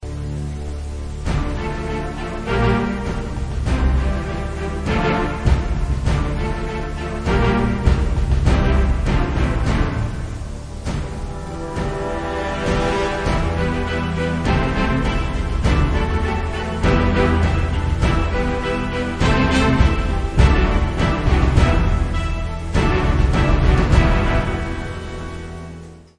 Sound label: bgm select card